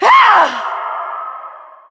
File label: death; Lissandra